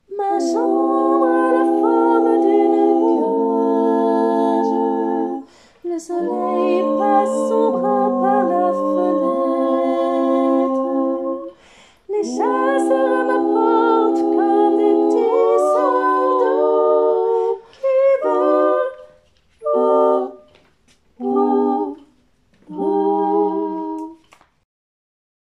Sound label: tutti